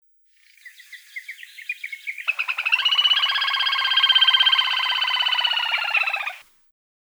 Tachybaptus ruficollis

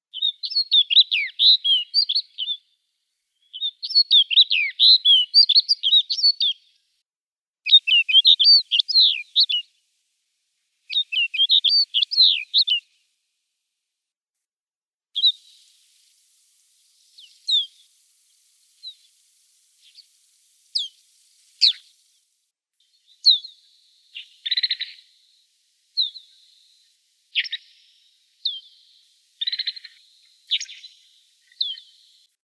Plectrophenax nivalis

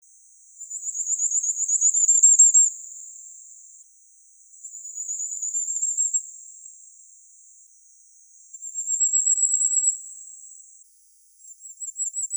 Regulus ignicapilla

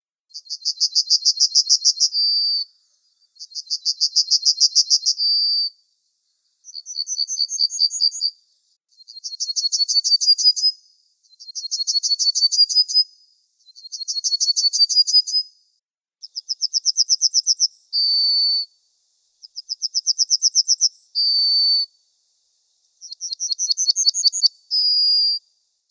Emberiza citrinella

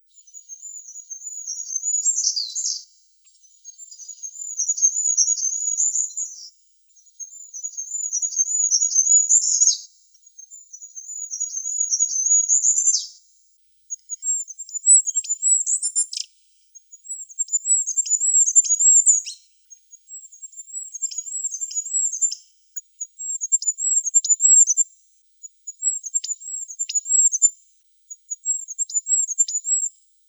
Regulus regulus

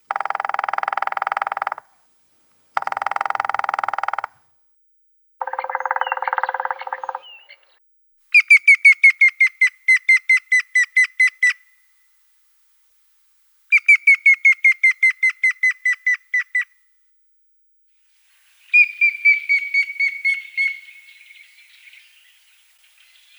Picus canus

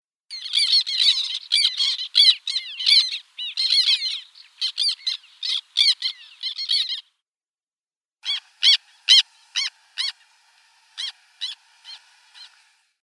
Chlidonias niger

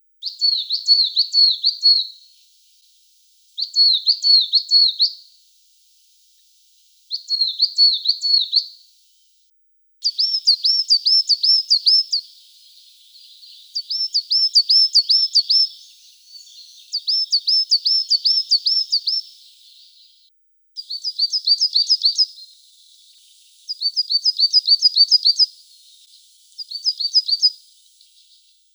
Periparus ater

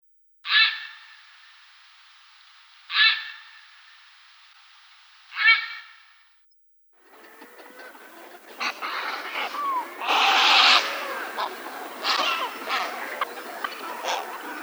Ardea cinerea

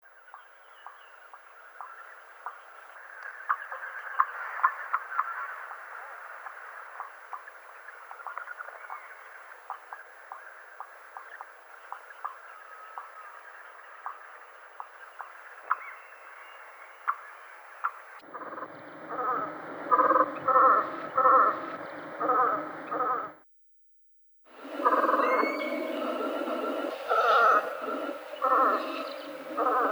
Melanitta fusca

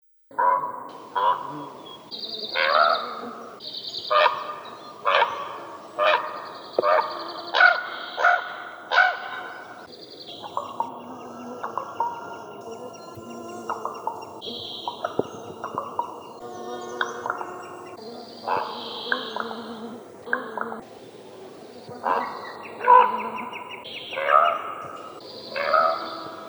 Corvus corax

